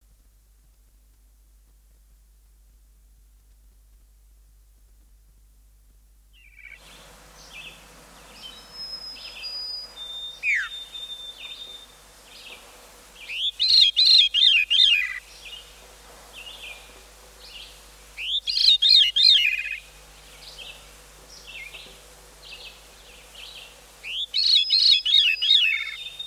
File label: veery, songbirds